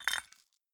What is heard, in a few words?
press stone; Kismet